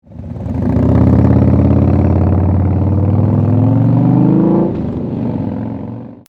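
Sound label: TAKEOFF, motorcycle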